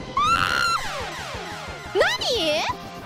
Scream AAAAA naniii; IRyScream

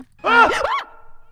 jumpscared; IRyS; funuhashi

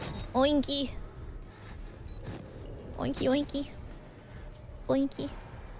oinky

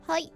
hoi

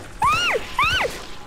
Scream; IRyScream

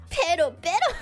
noises pero pero

